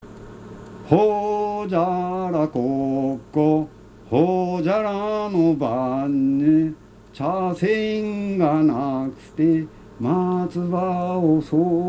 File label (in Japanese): hojari, minyou